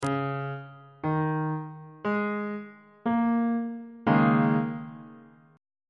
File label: d sharp dominant 7th suspended 4th 3rd inversion on bass clef